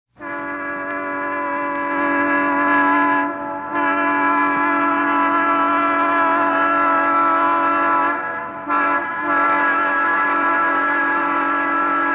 diesellokomotive - pfeifsignal; Bahnhof

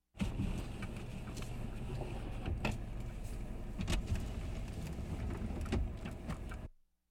scheibe öffnen (manuell); Auto-Zweiraeder

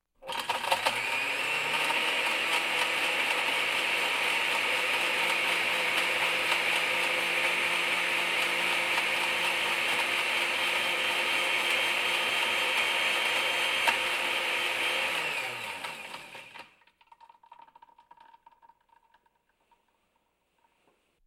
mixer; Alltagsgeraeusche

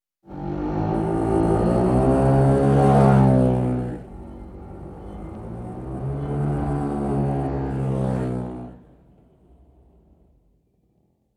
motorroller; Auto-Zweiraeder

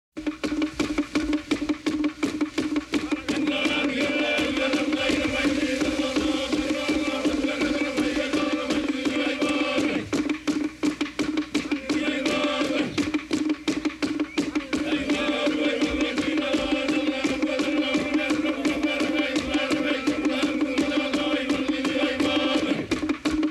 polinesischer kriegstanz; Suedsee

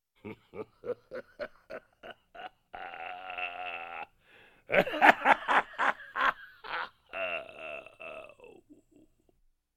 schauerliches lachen eines kobolds

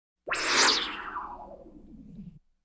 marsmännchen, Science Fiction Geraeusche

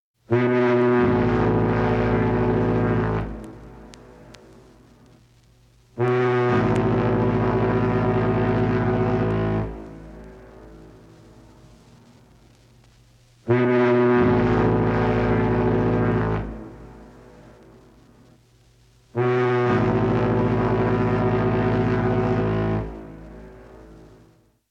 dampfschiff signalhorn; Suedsee

